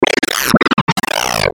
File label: Chopper Sputter Out Start Up; Blastwave